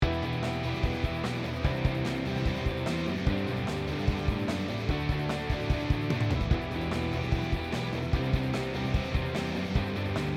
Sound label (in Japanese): ドラム mix Expander Gate mix